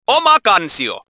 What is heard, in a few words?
psst